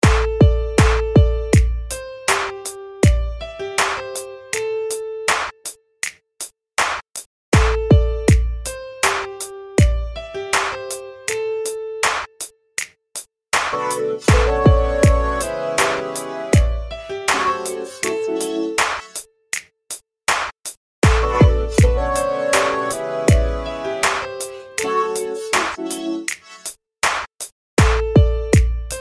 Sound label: chillout style ringtone